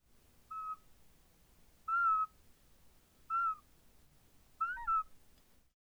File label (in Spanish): Vaso silbador